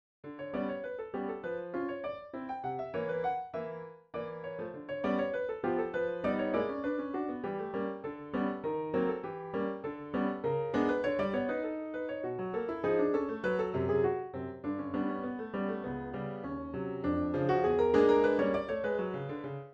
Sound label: Moonbeam Mazurka, solo piano